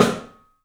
PIC SNR L, PICOLO ROOM, 3D Drums; 3D Sound

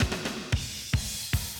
Classic DrumLoops, 110

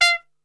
F 3 X PIC SHT, Brass; Best Service; Brass Super Section

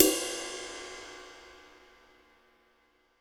New Brush Ride, Cymbals; Roland BK-5

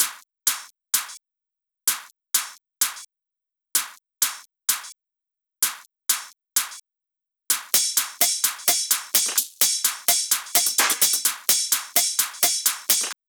Drum Loop 128BPM Perc; Loop Elements; VEC5; Drumloops; Multi Loops

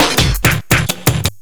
LOOP SD, 168 BPM, Drum & Bass

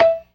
PERC, Synth and Organ

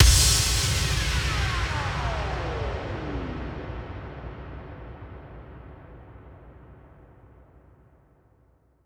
FX Kicks; Effects; Vengeance; Essential Clubsounds Vol.4

